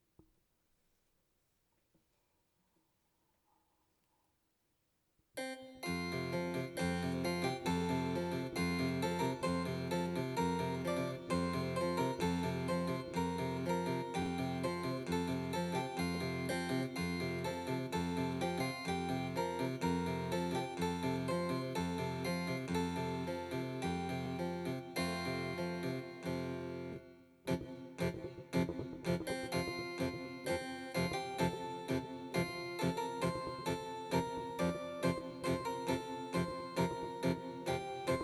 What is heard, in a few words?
harpsichord